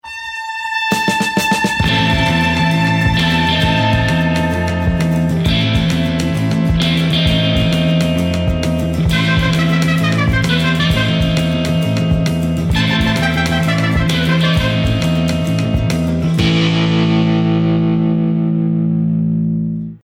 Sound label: start action